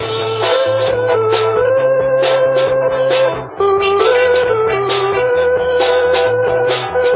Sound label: keys grooving, Phonky loops